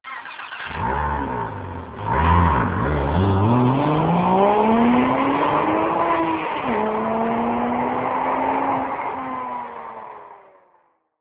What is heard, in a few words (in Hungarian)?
indítás indulás, porsche boxster